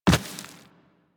Walk Rock, footsteps; Warframe; Operator

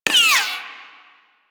Sniper Ricochet; DE; Warframe; Warframes; Frumentarius; ExaltedSniper

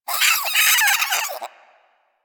Vocal Death, Hunting Critters; Warframe; Cryptilex

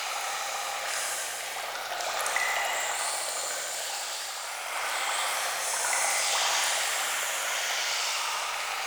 Witch Ancestor Cauldron Hint; Friendly; Verminia

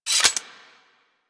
Pistol Reload; Weapons; Warframe; Tenno; Guandao

